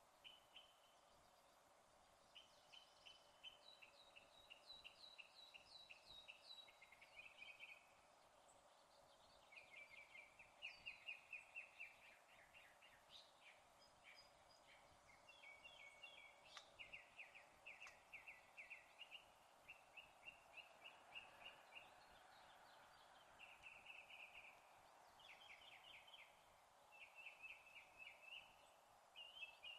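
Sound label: early morning outside the city, there is a car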